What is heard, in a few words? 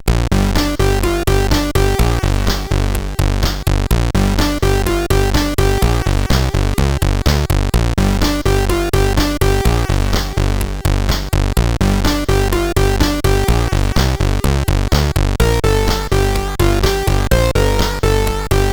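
Lights On, MOS CSG8580R5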